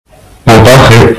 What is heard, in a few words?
siuuuu